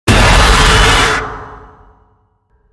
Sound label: jumpscare; bonnie